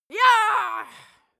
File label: female cheer